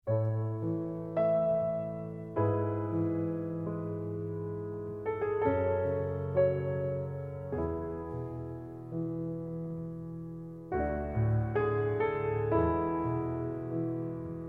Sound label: instrumental